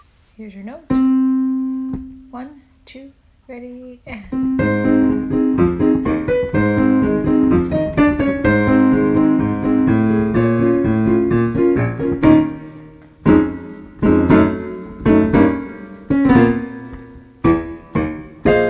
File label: Accompaniment; Harold